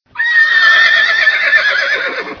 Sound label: Horse Whinny Sounds Car Horn